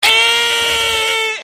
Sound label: killing the microphone, EEEEEEUGH; grumps